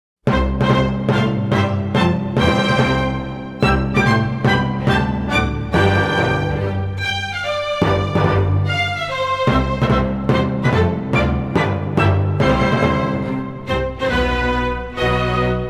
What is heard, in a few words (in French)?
Hymne du Rotary International